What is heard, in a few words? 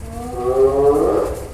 hyena